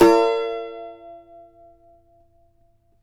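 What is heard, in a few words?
B major, Guitars; Spectrasonics; Hans Zimmer Guitars VOL-2; CAVA CHORDS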